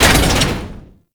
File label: Metal Crate Destroy; Warframe; Tau; Destructible Dynamic